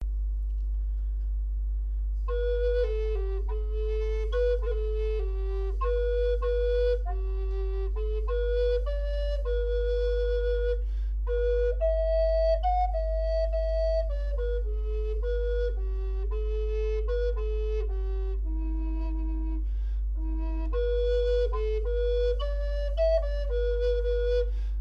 Son flute amerindienne mi 432hz

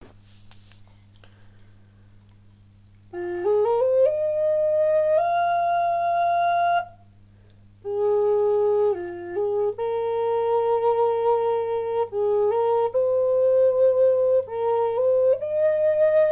Son flute amerindienne fa bambou

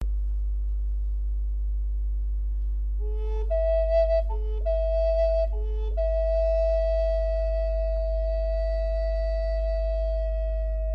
Son flute amerindienne la noyer

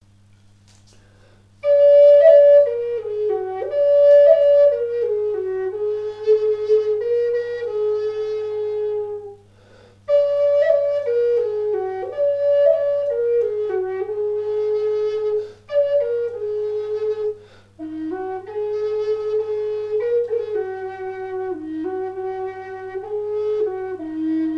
Son flute amerindienne mi bambou

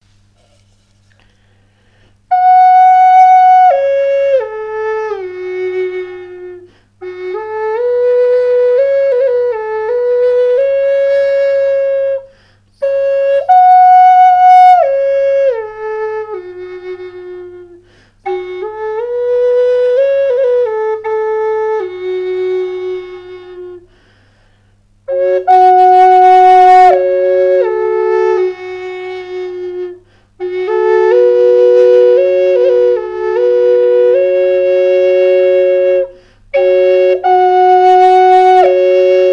Son double flute amerindienne fa bambou

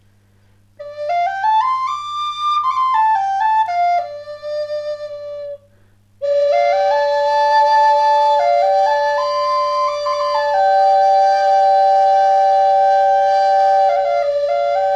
Son double flute amerindienne re canne

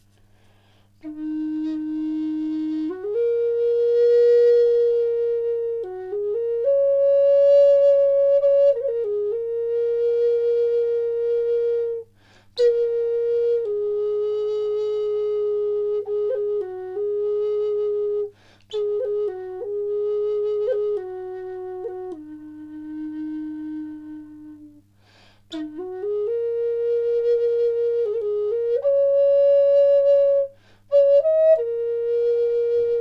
Mi 432 Hz en noyer, Flûte Amérindienne; Flûte Amérindienne de fabrication artisanale en noyer, tonalité Mi

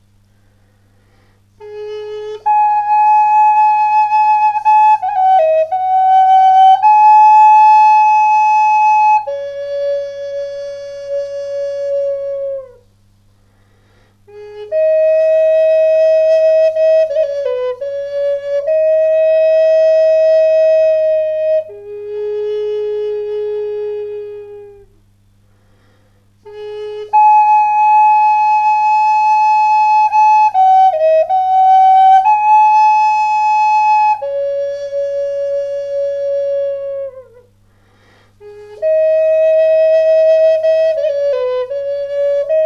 Son flute amerindienne la bambou

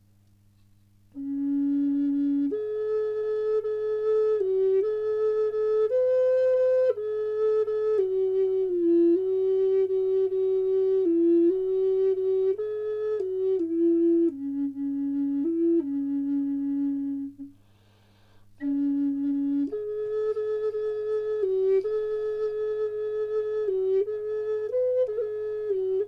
Ré 432 en chataignier

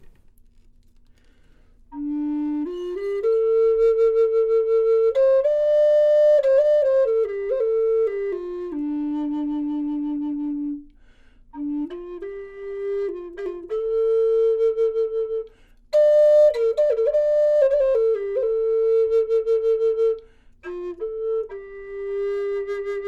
double Ré grave

